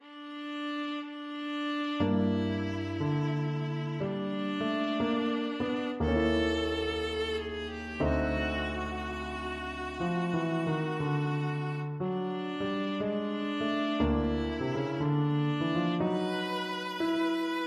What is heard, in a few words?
happy jazzy birthday, viola